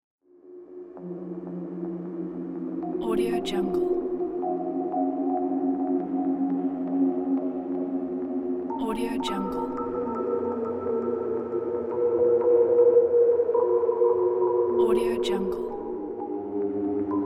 futuristic drone; dodique

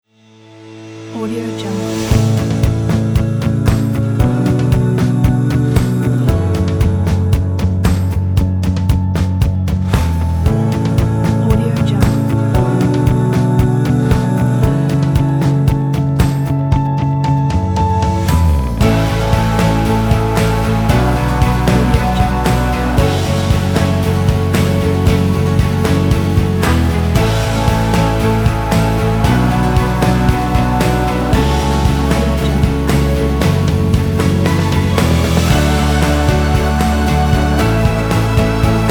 a beautifully uplifting corporate

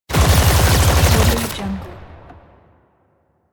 machine gun

